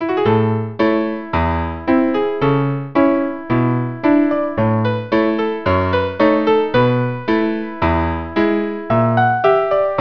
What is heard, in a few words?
Norwegian Dance Trio